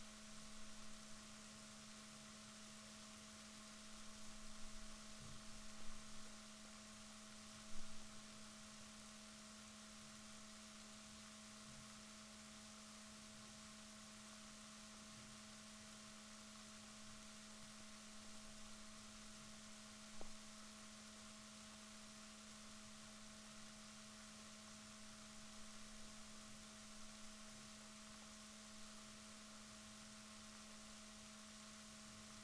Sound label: rorquals positif, Balaenoptera Physalus Chile